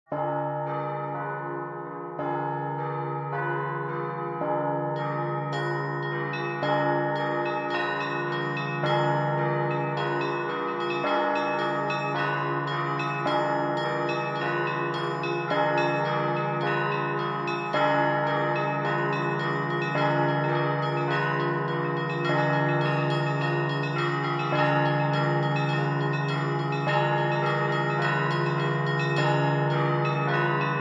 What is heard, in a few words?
Chime; Vera; Igor V Konovalov